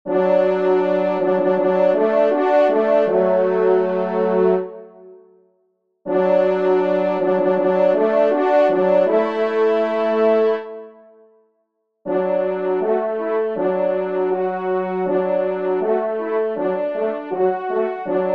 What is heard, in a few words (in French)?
trompe; Aubade Saint Hubert